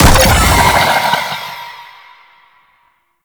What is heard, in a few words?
Blackhole Open, Weapons; Warframe; Cephalon